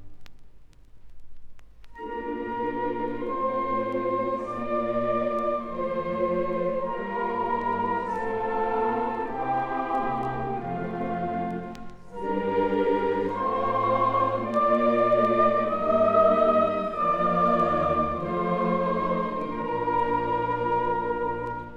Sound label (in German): Choral; Selig sind, die aus Erbarmen